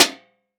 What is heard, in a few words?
Impact Sheet Metal Impact Crowbar Hit Trash Can Lid